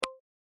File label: general button; phet io wrapper sonification